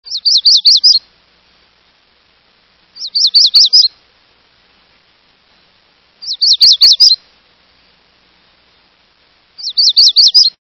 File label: Magnolia Warbler